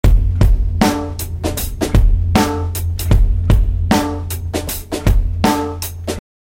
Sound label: drums